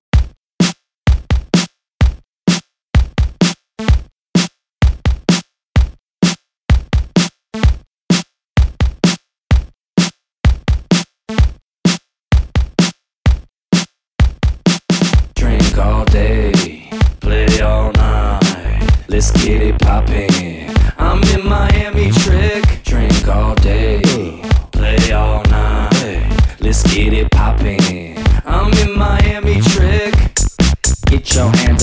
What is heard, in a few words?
bpm 128